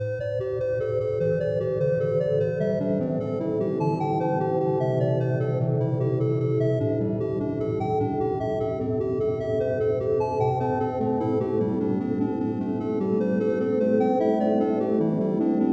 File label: music; afnimusic; funstuff